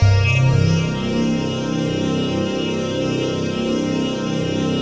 ATMOPAD; Best Service; XX Large; Pads

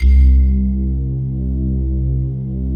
PAD; Best Service; XX Large; Pads

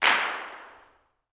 CLAPS, Gospel

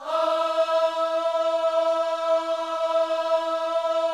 OHS E 4A, gospel vocal; Spectrasonics; Vocal Planet Vol.1 Gospel; akai

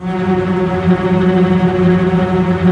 TREM F#3

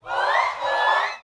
shouts, gospel; Spectrasonics; Vocal Planet Vol.1 Gospel; akai; UYC SHOUTS

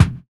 BDRUM; E-Lab; X Static Goldmine 2; akai

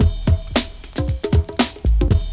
D3 BREAK

